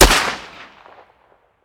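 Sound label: weapon dmr sup fire player atmo ext; gmod; viper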